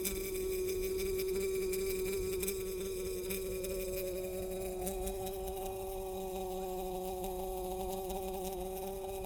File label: trapped in a wine glass, Vespinae; Overtones; Gallus/Frankfurt; Christoph Collenberg